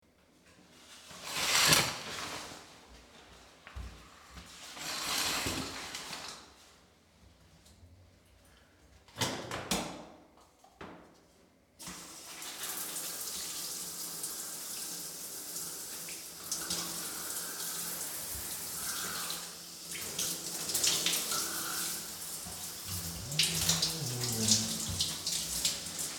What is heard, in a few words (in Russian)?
atmosfernyi zvuk dusha